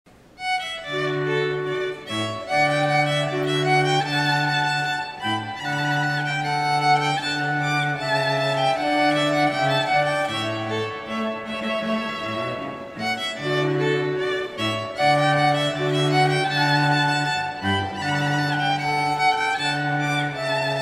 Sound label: Wedding Trio, Cello